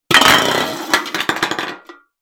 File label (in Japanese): 空の缶が転がる音